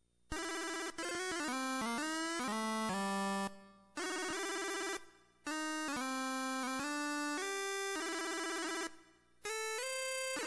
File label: steel lead